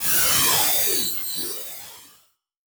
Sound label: Transition Whoosh Warble; Production Element; Title Transition; AdobeCC